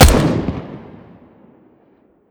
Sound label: fire first, weapons m16; arccw ud